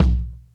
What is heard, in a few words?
BD Round Air, Bass Drum; Lost Tapes From Mars